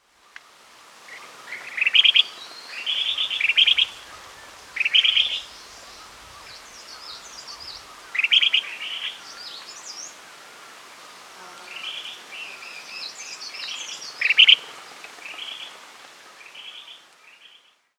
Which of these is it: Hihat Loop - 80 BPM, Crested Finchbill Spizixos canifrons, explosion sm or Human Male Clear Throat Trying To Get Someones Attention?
Crested Finchbill Spizixos canifrons